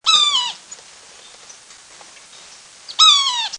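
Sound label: Sapsucker Yellow-bellied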